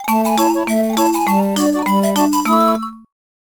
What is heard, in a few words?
Song of Birds (Short); Spirit Tracks Original Soundtrack